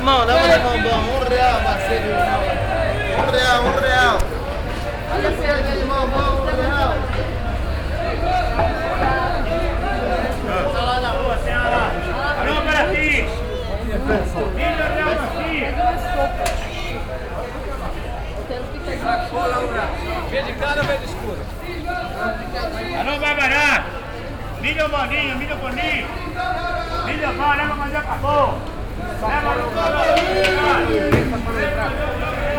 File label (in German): aufm markt, Brasilien; Fieldrecordings